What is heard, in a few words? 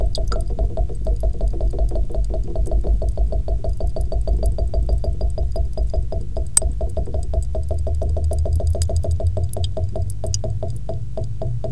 bubble